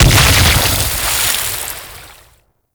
Pirate Cannon Explode; Warframe

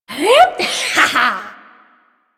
Lift Laugh, Corpus Lich; Warframe; Persona D

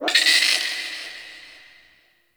Sentient Lair Exterior Creature; Ambience; Misc Creatures; Warframe

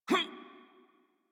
Generic Short Pain Operator Voice; Dialog; Warframe; Operator Mission

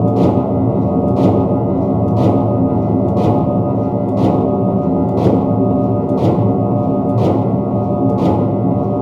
Cargo Elevator Amb Loop Distant; Warframe

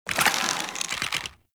Draw, Weapons; Infested; Cernos

